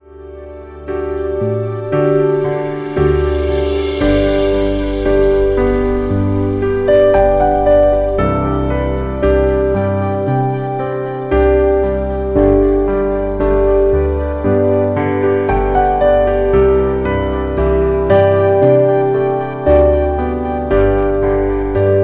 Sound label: touching iphone 8 ringtone